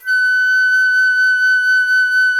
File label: FLT FLTF#, Flute; Miroslav Vitous - Solo Instruments VOL-2